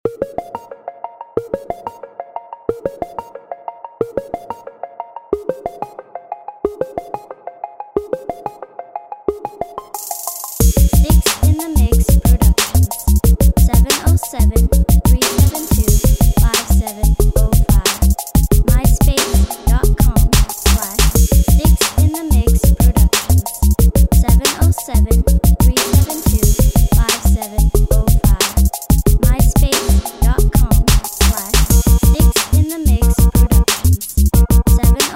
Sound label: reggae bass